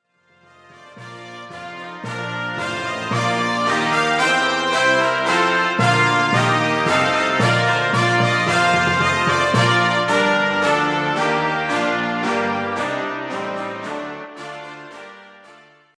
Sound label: brass